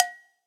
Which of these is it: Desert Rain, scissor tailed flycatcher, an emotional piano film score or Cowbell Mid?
Cowbell Mid